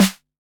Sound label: bright snare one shot g key